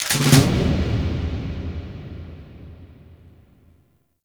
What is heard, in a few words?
Merchant UI Mission Start; Kahl; Veilbreaker; Warframe